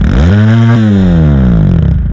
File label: Car Rev Short